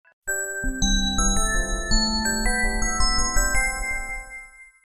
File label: message; itel